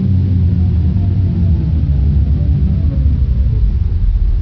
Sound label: power down